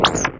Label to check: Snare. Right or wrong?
Wrong